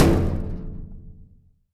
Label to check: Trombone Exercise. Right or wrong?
Wrong